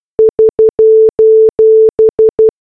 SOS morse code

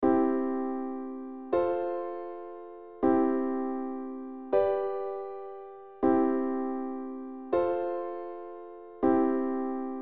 I IV I V, Progression Accords; Les bases harmonie

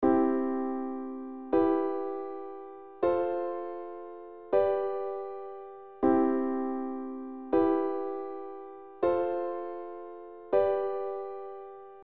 I IIIm IV V; Les bases harmonie; Progression Accords

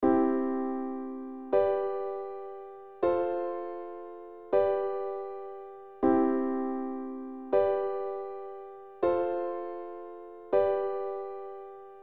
I V IV V, Progression Accords; Les bases harmonie

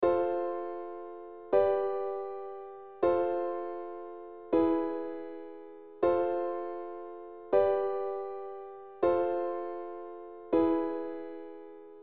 VI IVm VI V; Les bases harmonie; Progression Accords

